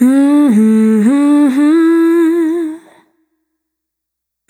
Integration, Vocal Samples, Single Voice Melodic Loops; Singles